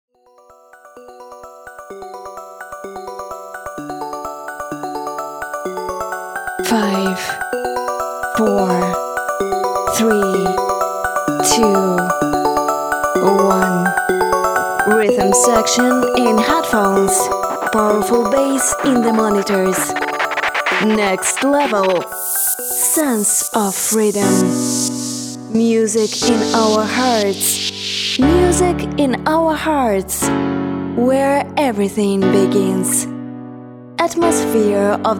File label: Arp Synth Jingle Pop Dsnce Acoustic Version